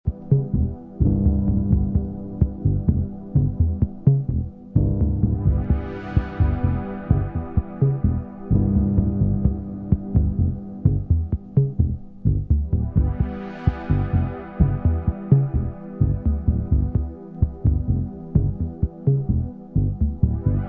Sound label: piano solo